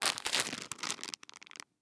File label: plastic bag move